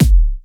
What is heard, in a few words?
Kick; FLPRO